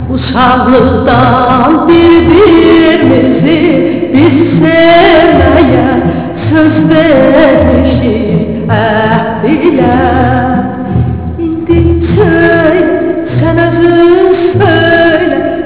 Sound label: duet